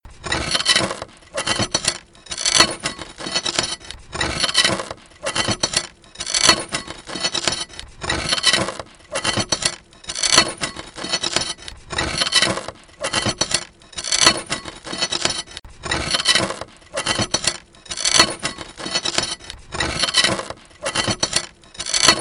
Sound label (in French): Verre contre metal